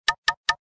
Message tone; LG100C

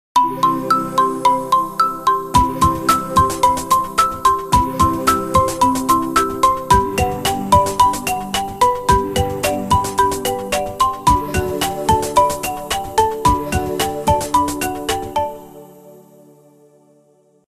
Spring, ringtones; Lenovo; A630

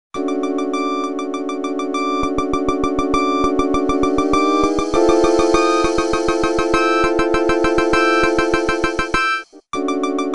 Nokia Tones; Lift Off; Windows Phone apps

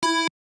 dial tone

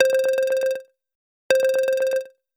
Hatching, ringtones; HTC

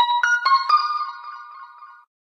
ray, notifications; Sony Xperia X

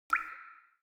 Dialkey water drop; Samsung SGH-i997 Infuse 4G

